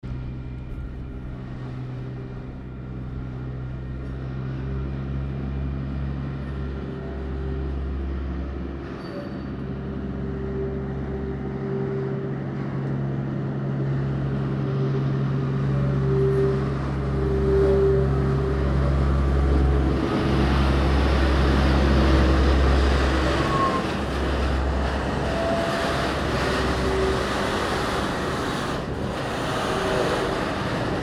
train noise; Port Chalmers

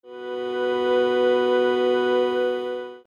drone B; Synthasis

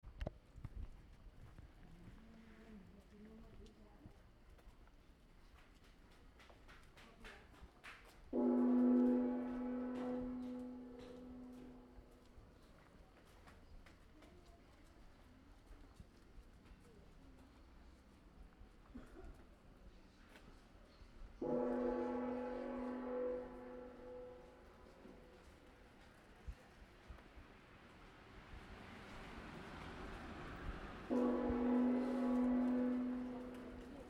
Sound walk dawn, Kathmandu; Budhha; Kathmandu soundwalks at dawn

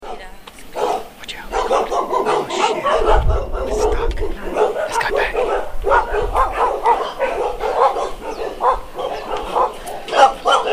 dogs, street recordings; budhas

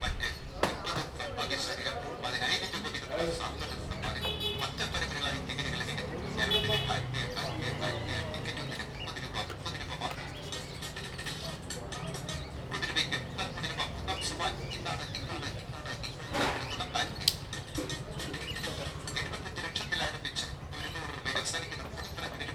lotto chants, South India street recordings

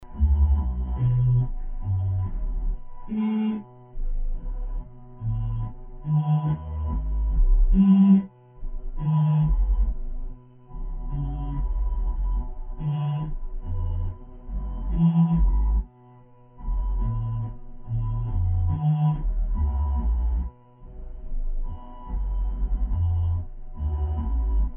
electric gamelan SLENDRO

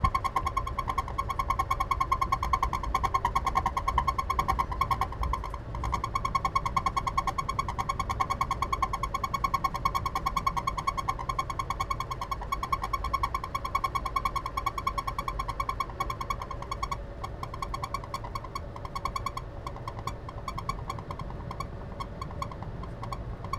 industrial squeeks on train, South India; South India street recordings

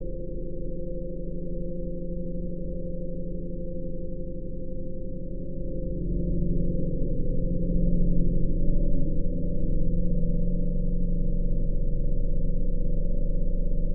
electric gamelan ENGINE NOISE

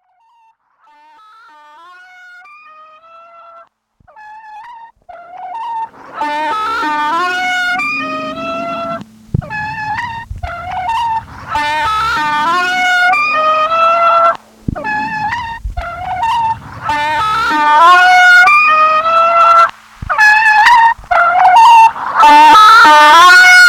shrine dictorphone mexico, street recording

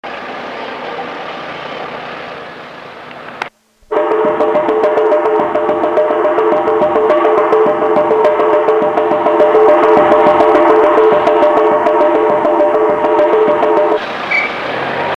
shrine dictorphone mexico, street recording

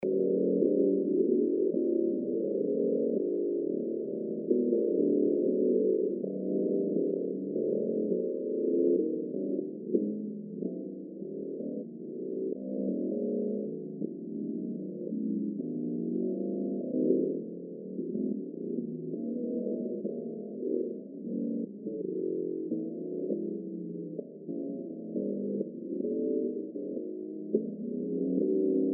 electric gamelan ALIEN GAMELAN

